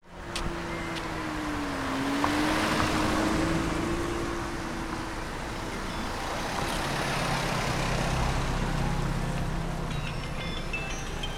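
recorded night on the road car arrived at the store to play to music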